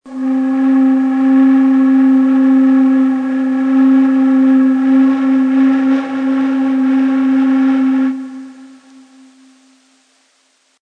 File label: Kaba Kurdi, Ney